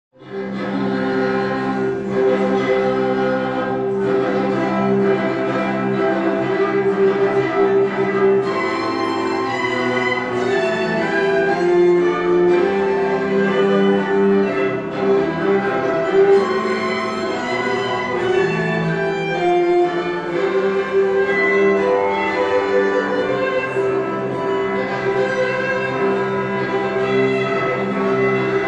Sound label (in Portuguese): MARCHA NUPCIAL QUARTETO DE CORDAS